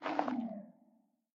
Sculk Sensor sculk clicking stop

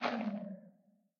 Sculk Sensor sculk clicking stop

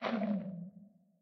Sculk Sensor sculk clicking stop

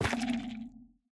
Sculk Sensor place

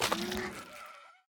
Sculk Sensor break

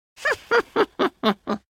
Witch cheers

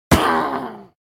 Witch dies

